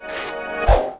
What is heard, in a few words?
special arrow; Aleria